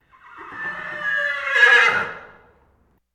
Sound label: Animal effects snorts grunts farm ambience